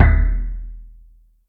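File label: Rattle Boom; Yamaha DTXpress; Effect 1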